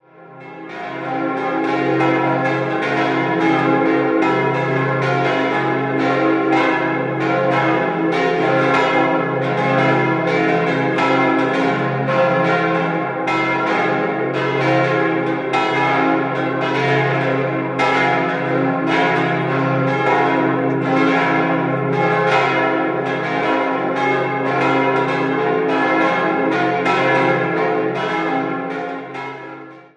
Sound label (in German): Regensburg Nikolaus, Glocken